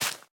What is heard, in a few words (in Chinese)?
大型垂滴叶破坏声